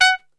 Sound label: F# 3 X PIC SHT, Brass; Best Service; Brass Super Section